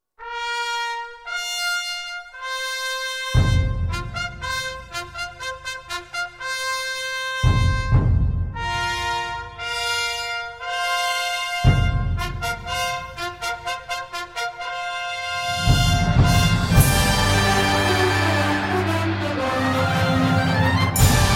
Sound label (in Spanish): Fanfarria del aniversario